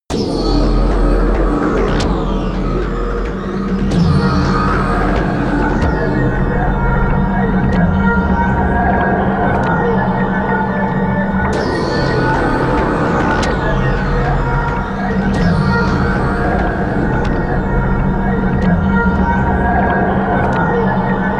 drone mercury

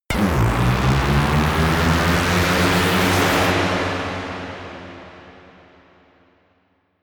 reverb rumble, risers

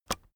keyboard external space release soft, computer; kommunikation

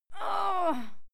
female fight moan pain

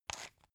pepper mill soft; gastronomie; soundpool

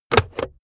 car backseat table pull in cupholder quick hard

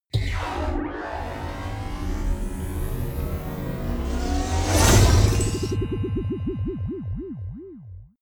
magic warp personal jump depart; swooshes-swishes

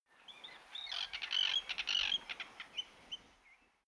bird chirp forest; natur tiere; voegel

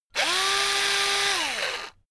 electric drill screw in long; maschinen; bohrer; soundpool

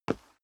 step sneaker wood parquet slide short; schritte

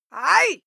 female fight attack shout; schreie; menschen

